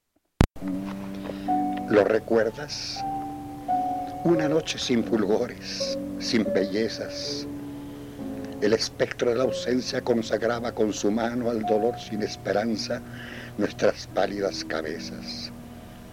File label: Piano